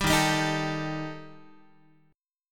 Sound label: Eb Major Flat 5th Eb Guitar; EbMb5 strummed; JGuitar